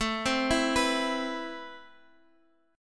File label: A Minor Add 9th A; Amadd9 arpeggiated; 1,b3,(5),9; x,12,10,9,12,x; JGuitar